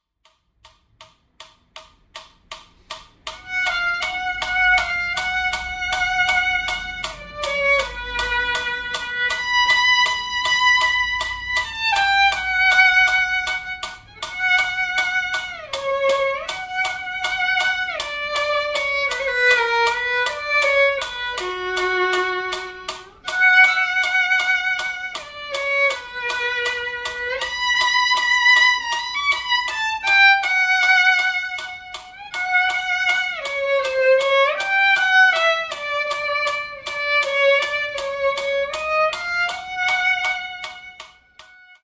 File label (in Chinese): 第四號學生協奏曲 第三樂章 小提琴 示範演奏; 賽滋; 新版 篠崎小提琴教本 第三冊